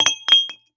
Household Glass Wine Bottle Fall To Ground Doesn't Break